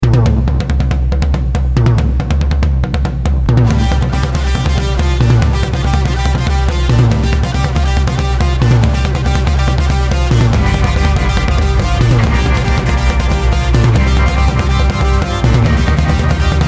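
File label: Disco Short Toms; 80's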